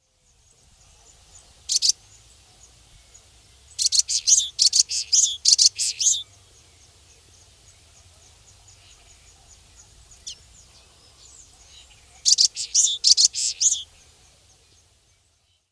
bico de lacre

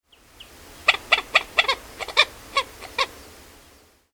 galinha d'agua

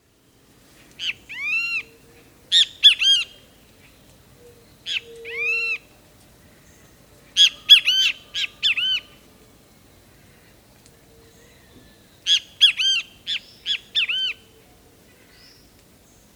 bem te vi

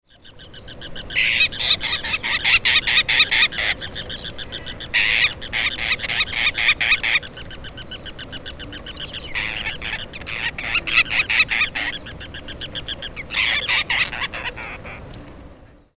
trinta reis de bico vermelho

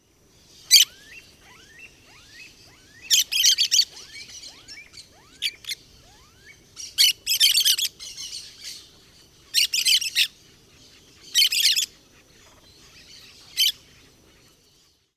periquito de encontro amarelo

